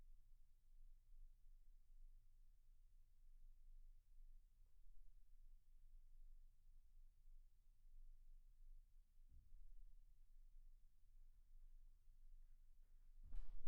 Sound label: MAIN VOC